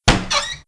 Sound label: Cabinet Open Wood; Playable Tutorial Level